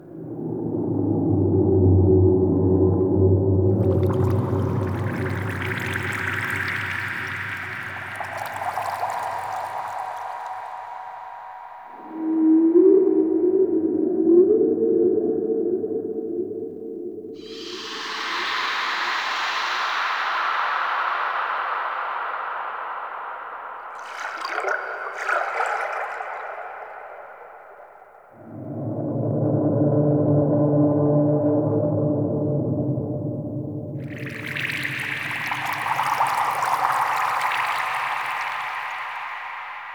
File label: Ocean Underwater Ambience; Warframe; Grineer Ocean